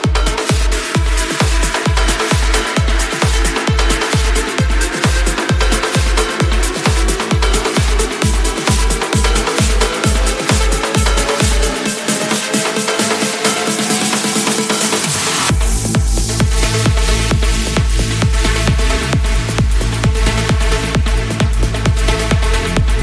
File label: sweet music english ringtone